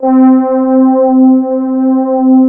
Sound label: MELLOW, YAMAHA; Analog Fantasies; USB Soundscan vol.49; AKAI